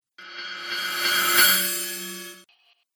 Reverse Cymbal Whoosh

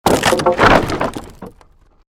created wooden barrier smash medium

